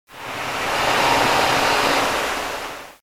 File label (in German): Geraeusch max